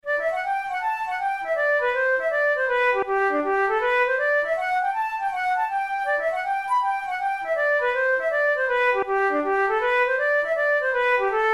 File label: hornpipe, flute tunes; McNally's hornpipe